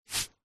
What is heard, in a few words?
hat sombrero take off head